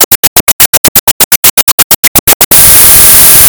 Sound Weapon

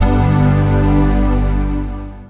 Macintosh Quadra AV Startup, chimes

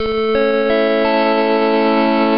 Macintosh IIfx Death, chimes

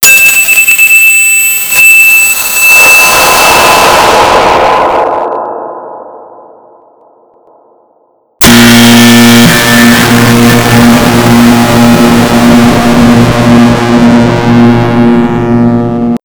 EXTREMELY LOUD CORRECT DING AND INCORRECT BUZZER

